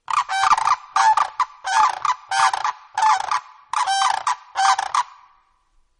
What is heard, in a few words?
BIRD Sandhill Crane Antigone canadensis